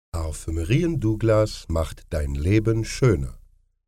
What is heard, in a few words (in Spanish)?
Jingle Aleman; Douglas